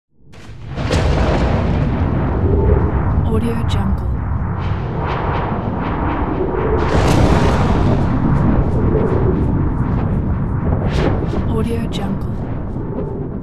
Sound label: artificial thunder